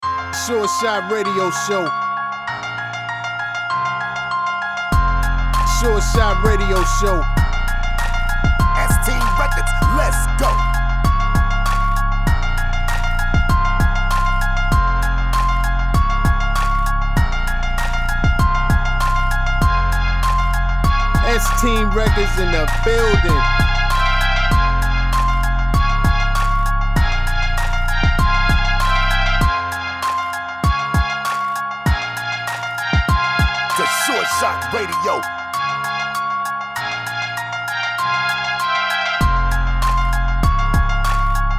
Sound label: Piano